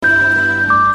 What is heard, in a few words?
sms tone; orange